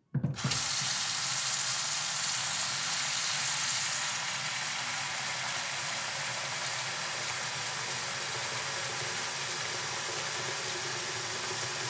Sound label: sink water pot fill